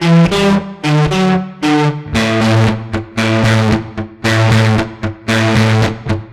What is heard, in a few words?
Guitar; Eclectic Electronica